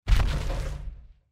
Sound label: walk, power armor; clothing system